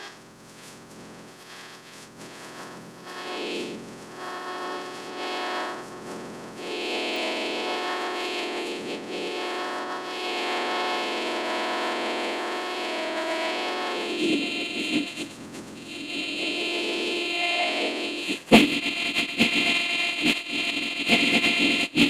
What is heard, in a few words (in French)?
bulgare voix; machine learning; rave ircam